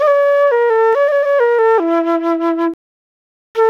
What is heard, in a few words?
flute, Loops